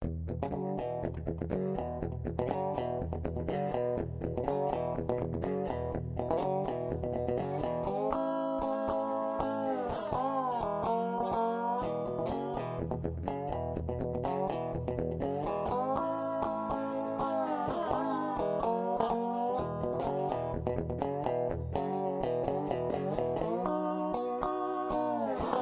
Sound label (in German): bottle, gitarren